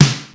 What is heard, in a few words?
snare drum; Crypton - DrumBase5000; 52 SNARES